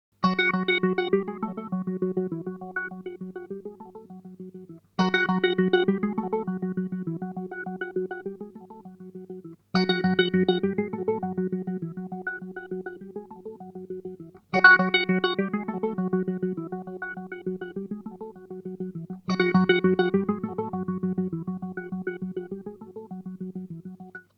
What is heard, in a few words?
Boss SL 2 SFX